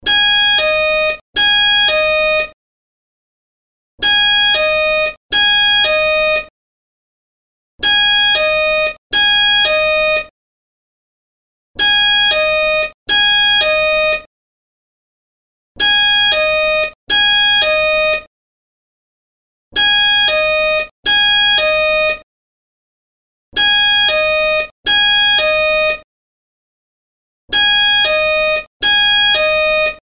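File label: intercom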